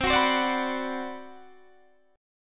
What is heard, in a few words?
D 13th C